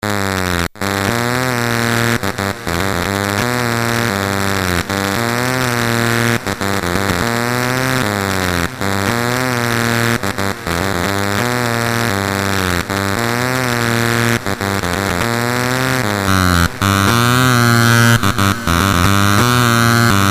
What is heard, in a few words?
Distortion; Deformer